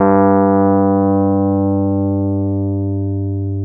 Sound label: RHODES EP2 G1; Sonic Reality - Vintage Timetraveler